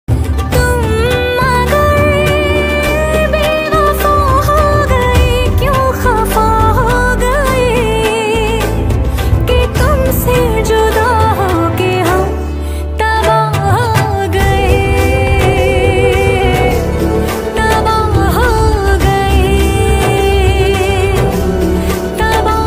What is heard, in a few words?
song ringtone, movie song ringtone; kalank movie